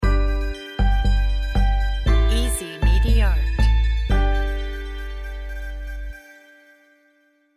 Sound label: celebrate logo